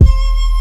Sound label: Hit